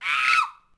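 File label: Settler Child Die Vox; Civ4 warlords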